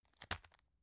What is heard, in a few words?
tissue package drop soft